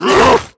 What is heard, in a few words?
grunt hard, zombie vox; creatures